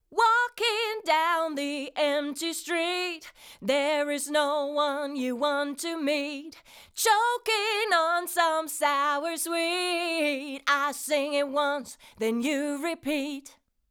Vocal 3 zu 1 max. GR 8 dB AIR 2; Golden Age Project Comp 554 MK II

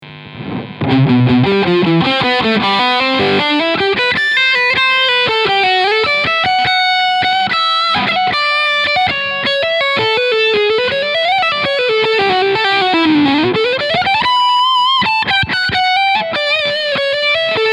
Jackson JS32 Dinky E Gitarre Leadsound Steg PU

